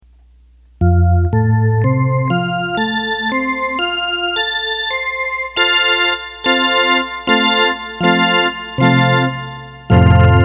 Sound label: Jazz Organ